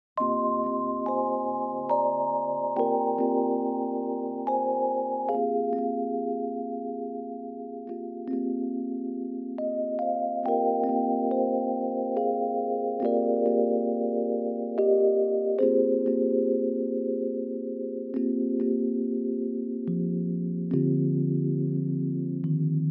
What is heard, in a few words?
piano modal